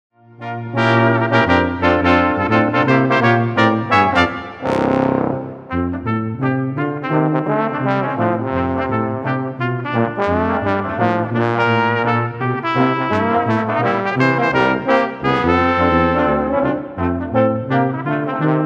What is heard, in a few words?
Jingle Bells BRASSQUINTETT